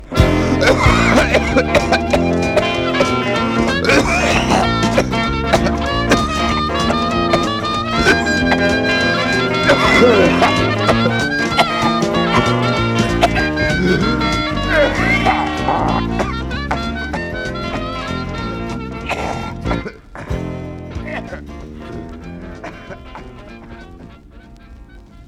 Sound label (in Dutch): Solo